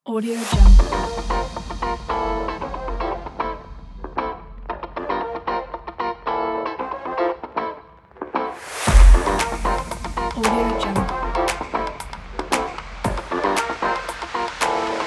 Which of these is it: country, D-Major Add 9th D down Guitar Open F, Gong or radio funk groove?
radio funk groove